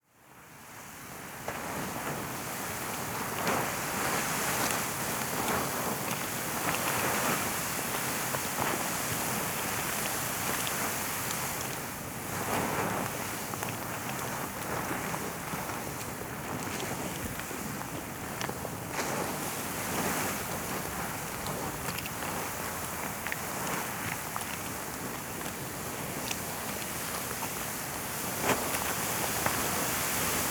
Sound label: Geyser hot water lapping and splashing with light pressure steam and bubbles; Charlie Atanasyan; Geyser sound library by Faunethic